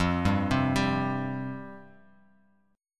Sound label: F Suspended 2nd F; Fsus2 arpeggiated; JGuitar